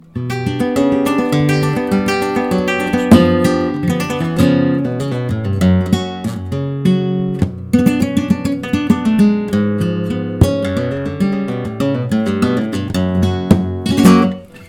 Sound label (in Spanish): falseta